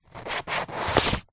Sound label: foley paper sheet thick knife rub across; zapsplat